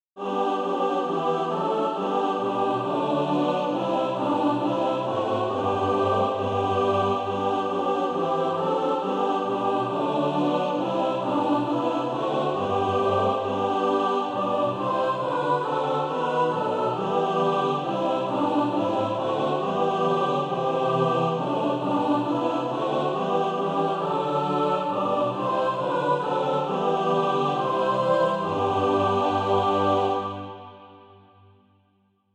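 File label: mixed, Practise Music; SATB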